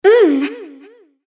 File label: moan; Imperial Girls